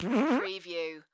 Fart Squeak

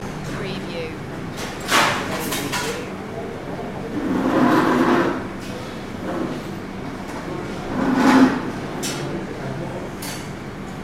chaotic restaurant ambience; loop

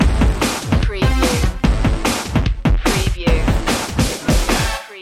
goliath funk

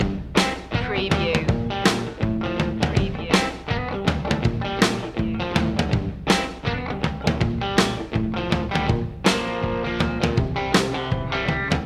vintage blues loop

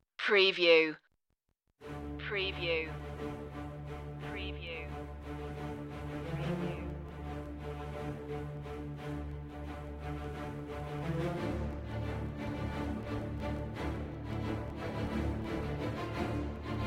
strings brass choir

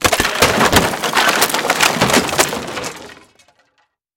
Crash Wood

